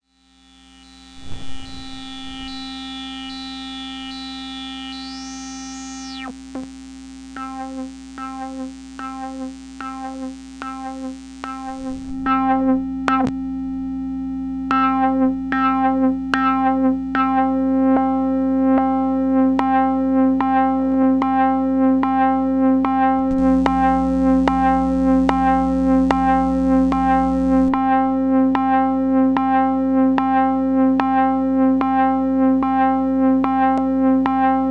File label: triangle; Parasite